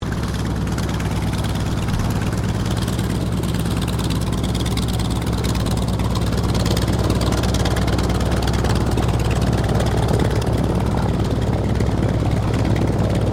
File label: AIRCRAFT MUSTANG KITTYHAWK TAXI; Mixed aircraft squadrons; Airplane; Airplanes; Vehicles